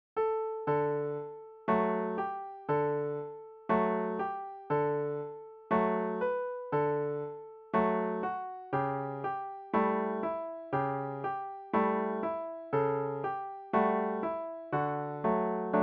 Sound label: its raining piano